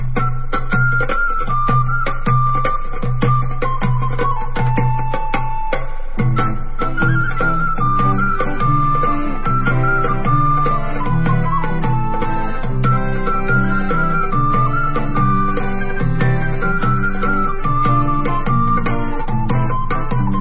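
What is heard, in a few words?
instrumental, Israeli Dances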